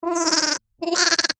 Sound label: cartoon animal lamb sheep baa